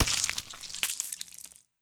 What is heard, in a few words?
Impact Gore